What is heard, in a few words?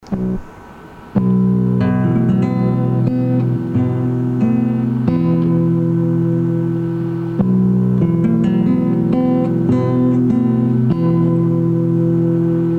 Guitar